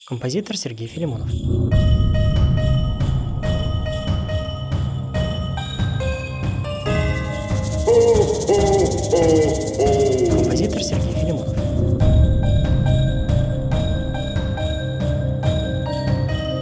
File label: Halloween parody of the Jingle Bells